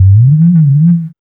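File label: bass glide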